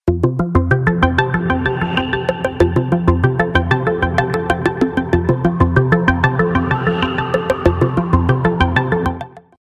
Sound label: message tone